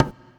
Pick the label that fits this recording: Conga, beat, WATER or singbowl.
Conga